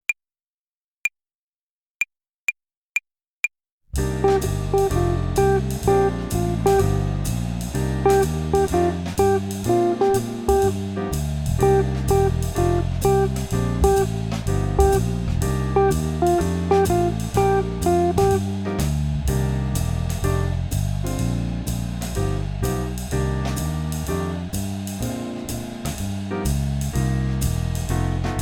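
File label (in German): Swing Phrasierung Übung; play along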